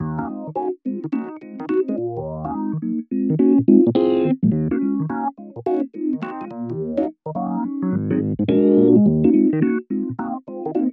epno adv extreme

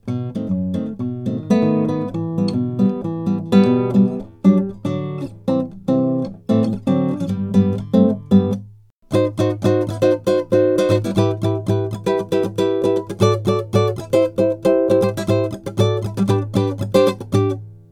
nylon dry

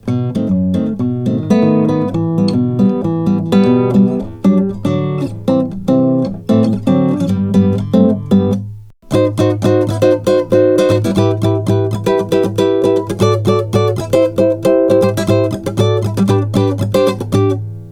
nylon upfront intimate

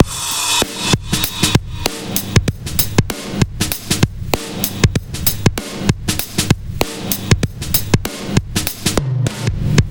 drum loop heavy squashing neg. ratio

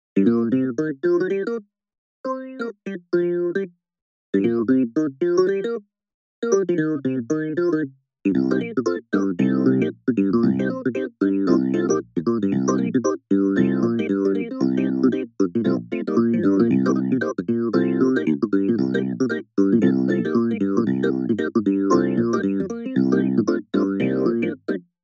clav swirly

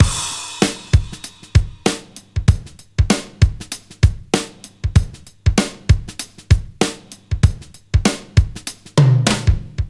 ac drumloop fair glueing enhancing

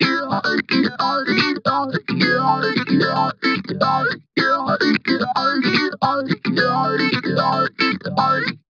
Funk guitar stereo talker

